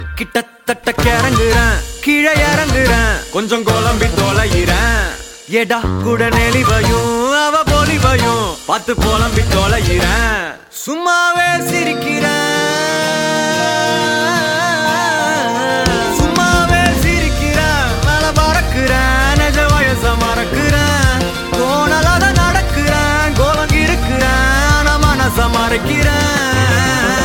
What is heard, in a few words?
Ringtone